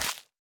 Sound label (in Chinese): 大型垂滴叶破坏声